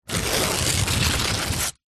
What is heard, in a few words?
household telephone directory yellow pages many pages tear out